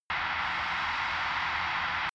drone spaceship vent

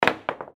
billiards jump shot

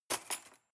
cutlery cake fork drawer grab hard

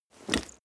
leather metal movement soft single

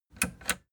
plastic button latch click disable slow

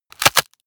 bone break large

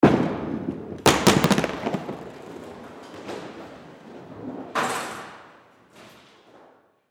bowling ball rolls off

